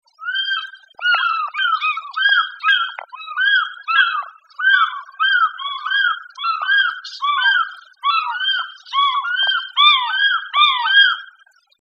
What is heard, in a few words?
African fish eagle 2 birds